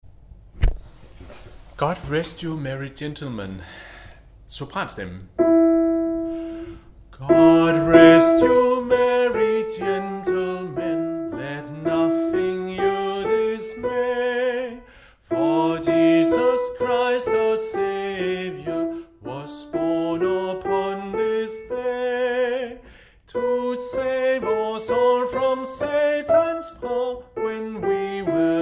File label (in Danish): sopran; God rest you; harlev koret